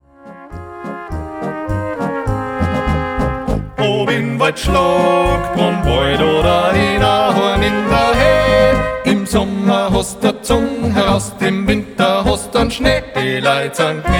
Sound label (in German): Marsch